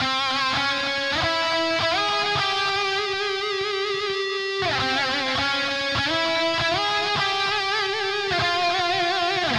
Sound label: Drums and Jingles Evil Lead F